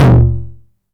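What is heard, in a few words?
909 mid tom tape saturation; Toms; Goldbaby Tape808 & Tape909; The Tape 909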